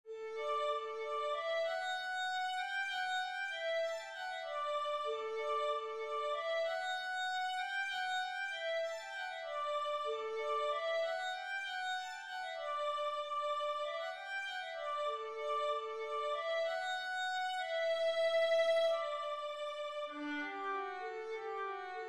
sara violin

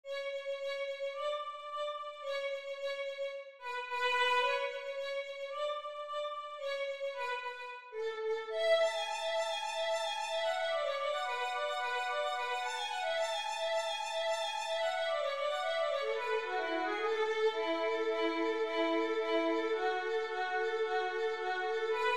fiddle easy violin

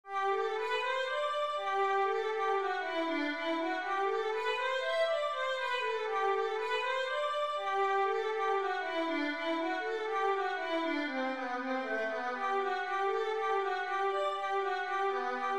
etude, violin

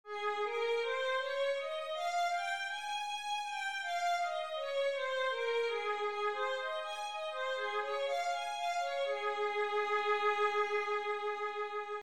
violin A flat major, scales 1 octave

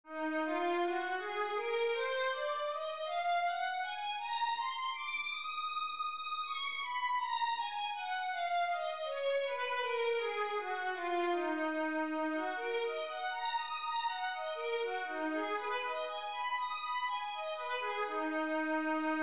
violin e flat minor, scales 2 octave

